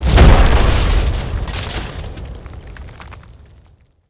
Skull Breaks

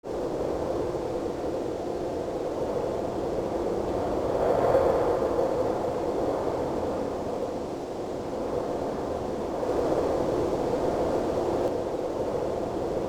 Wind; Lunar 2 Eternal Blue; psx

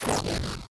SFX Hero Death

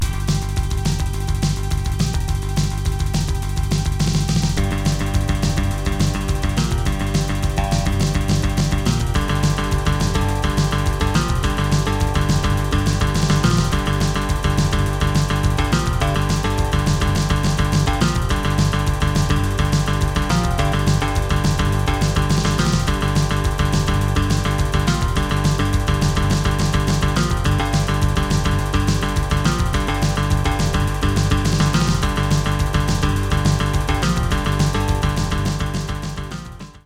Banjo; hook data east pinball soundtrack

